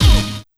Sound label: Uh! + Hit